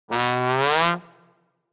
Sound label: Trombone How Rude